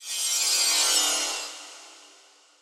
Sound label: bonus stop; Rescue Team3